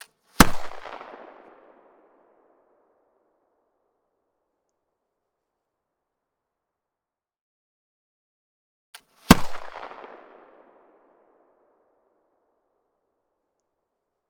Rifled Flintlock Pistol M1820 - FIRING - 4m Left; Black Powder Guns

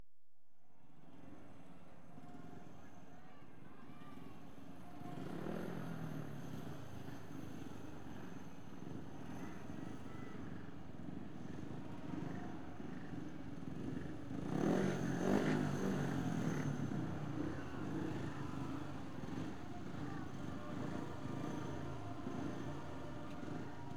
Race (With 4 Bikes); BBC Sound Effects Library; Adventure Sports

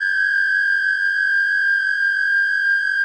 Feed chorused, Feedback FX; 99Sounds; Dark Engine

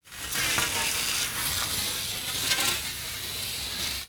metal dragging; Sound Design Tools; Raw Foley

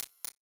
Hi Perc, Data Mosh Hits; Hats - Shakers - Ride - Cymbal; 99Sounds; Mangling Audio

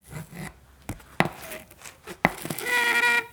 dry ice boring; Sound Design Tools; Raw Foley

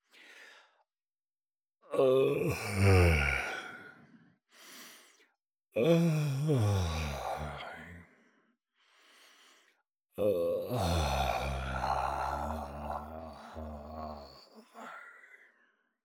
HUMAN BREATH Male Sleepy Yawn; Articulated Sounds; Human Male Breathe

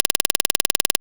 scroll, Interface Sounds; Kenney's Sound Pack

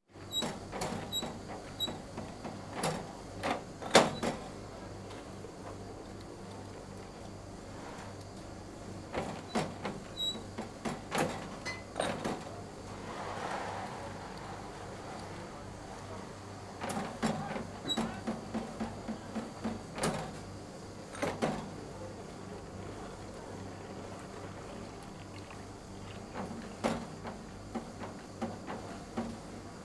Glass Milk Bottles Filled, Farm Machinery; BBC Sound Effects Library

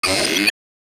Vox; The Weird Side Samples; 99Sounds

